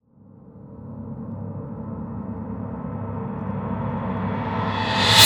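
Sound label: Reverse Single Strike, Cinematic Metal Impacts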